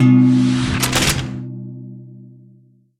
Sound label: Earn Missile; Retrobooster